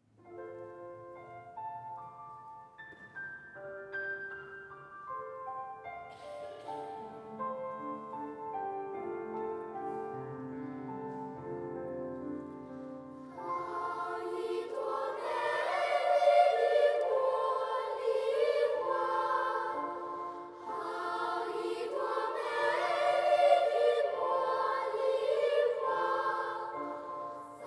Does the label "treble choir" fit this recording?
Yes